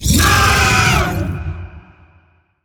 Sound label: Vocal Attack Short; Warframe; Zariman; Void Angel